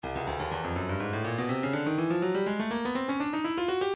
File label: stylus breakbeat midi 123 bpm festivo